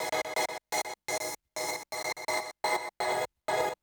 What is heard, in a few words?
FX Industrial 125